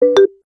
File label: push